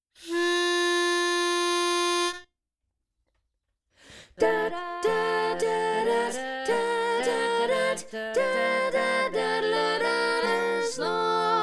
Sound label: tenor predominant